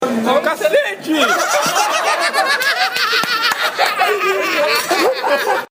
risos

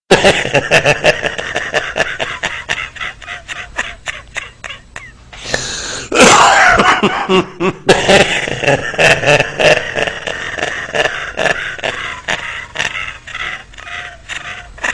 vinheta velho rindo; panico na tv

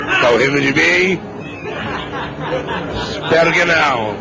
rindo não

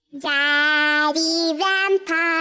gritando; the mini moonies

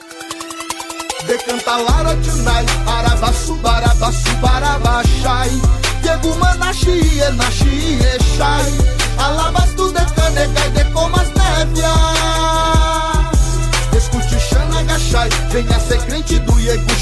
rap lingua estranha

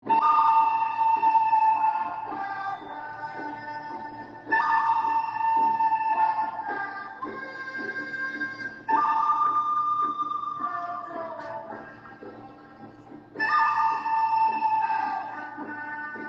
flautinha

